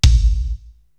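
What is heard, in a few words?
BD, bass drum, drum machine; Korg TR-Rack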